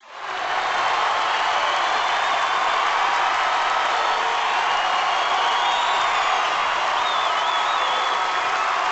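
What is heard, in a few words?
Boxing Crowd Large Cheer Loop; Crowds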